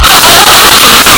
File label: jumpscare sound; FNAF